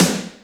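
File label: Snare Arena, Alesis D4 drum machine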